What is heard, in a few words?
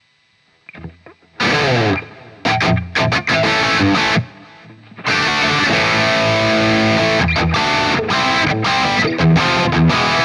noodling; greasy cameron